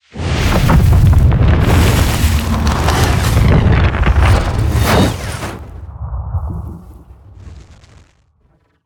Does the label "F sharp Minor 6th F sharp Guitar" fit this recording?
No